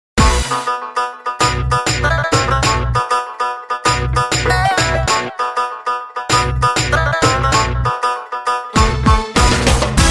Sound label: Balkan Music